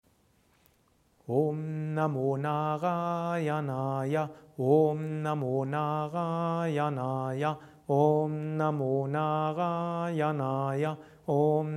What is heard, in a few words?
Om Namo Narayanaya fast Mantra Recitation